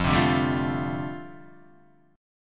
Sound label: F 6th Add 9th F down Guitar DADGAD, chord